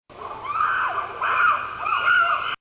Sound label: Chimp Scream